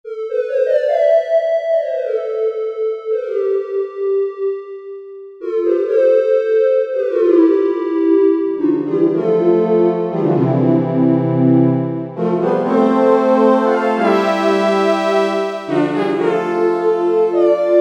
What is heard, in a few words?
JP8 Hollow; V-Synth